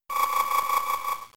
Ice Beam

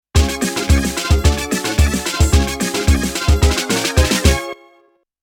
Opening Fanfare; Namco Museum; Pac-Man Arrangement; Arcade 1996

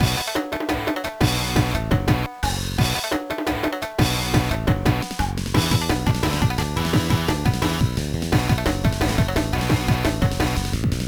BGM; Naruto Konoha Senki 2003 gba

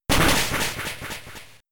Hit Super Effective; Pokemon; Gen 3; attack moves; RSE

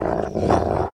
Wolf Growl

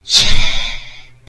Teleporter death

